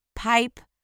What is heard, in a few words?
pipe